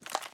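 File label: foley player hybrid scope side on; gmod; viper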